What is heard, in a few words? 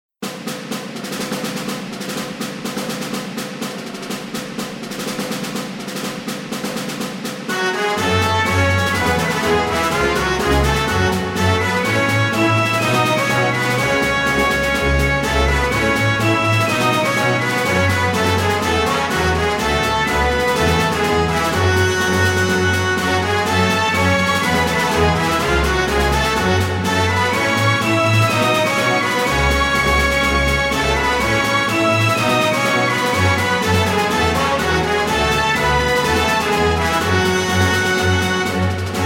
bgm orchestra; maou